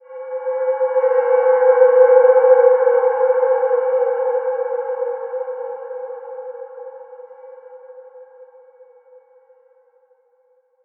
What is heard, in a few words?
Wide Dimension C4 f, healing soundscapes